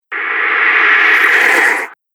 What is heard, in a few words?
Whoosh Of The Wormhole; Sci Fi Sound Effects